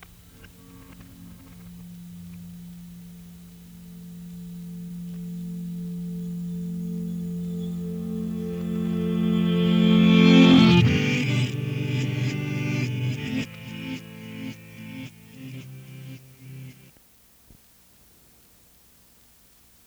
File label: instrumental